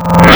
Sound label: E. GUITAR